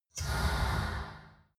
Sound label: steam puff short